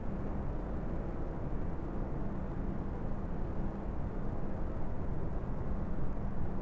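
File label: ambience Interior Hallway; Star Wars Obi Wan; XBOX; Theed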